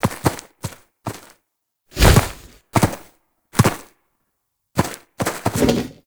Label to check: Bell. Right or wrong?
Wrong